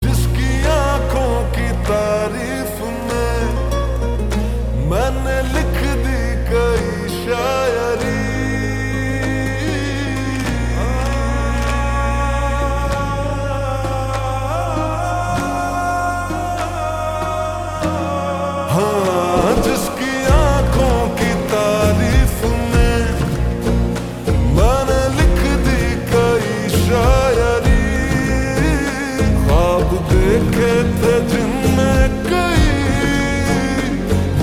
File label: Ringtone Slowed Reverb